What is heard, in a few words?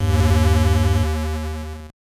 Game Starts; Anticipation; NES